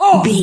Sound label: voice male hurt pain